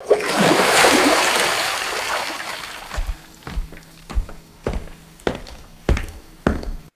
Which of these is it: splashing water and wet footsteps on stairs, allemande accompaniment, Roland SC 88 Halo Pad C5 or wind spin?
splashing water and wet footsteps on stairs